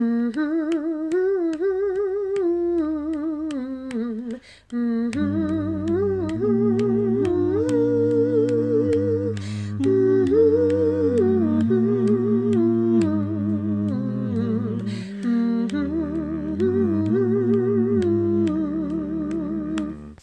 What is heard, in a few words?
Amazing Grace fast